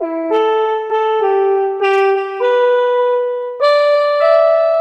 lead (soft brass); Sonic Foundry (Sony Creative Software); Ugly Remnants VOL-2

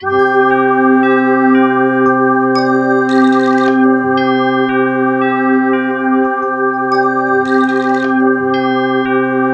C3, Synth Line; 90 s Sample CDs; NiceBeats; SynthLine VOL-17

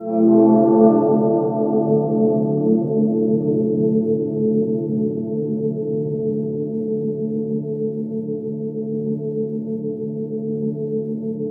AMBIANT, Special FX Twisted; USB Soundscan; AKAI

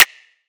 Snap; Antidote Advent; Infected Track Drums

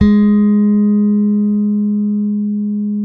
bass sustain G#3; Sampleheads; Will Lee Bass Library

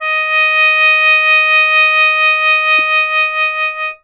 sheng d#4; WORLD INSTR 2; WOODWIND AND BRASS

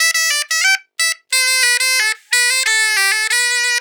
BOMBAR, Celtic Flavours; USB Soundscan vol.42; AKAI

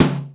RIM TOM L, DRUMS; East West - Global Sounds

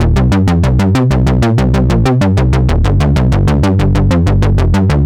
Arp Bass, 95bpm, C, analogue circuit; musicradar

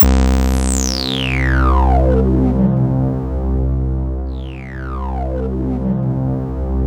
C2, Synth Line; 90 s Sample CDs; NiceBeats; SynthLine VOL-2

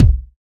Old Kick; Roland BK-5; Kicks

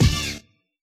Hit H, Yamaha DJX

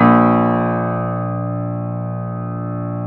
A#0 R, Keyboards Acoustic; USB Soundscan; AKAI

